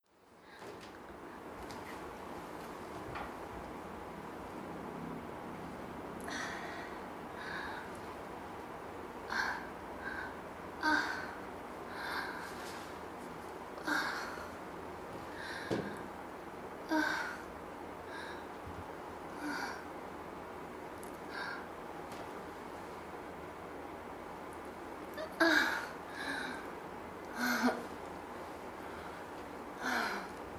卑猥すぎる喘ぎ声を出しながらオナニーするセックスレス妻のエッチボイス

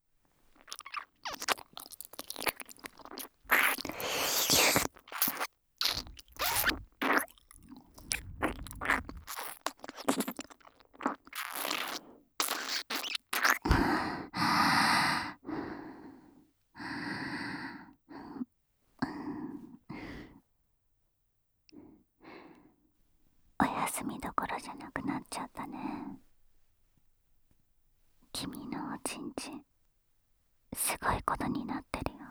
クールお姉さんの添い寝耳舐め手コキ＆お掃除フェラ

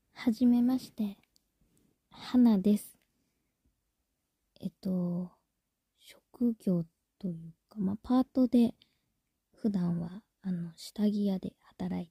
下着屋さんのお姉さん Hな喘ぎ声

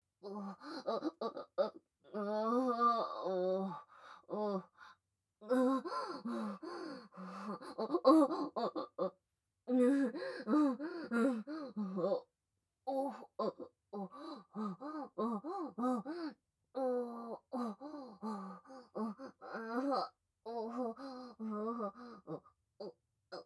オホ声 バック

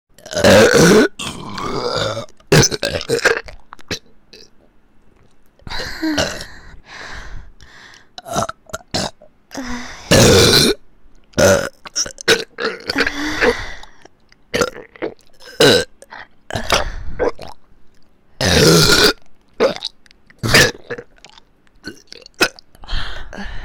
両耳 ゲップのみ

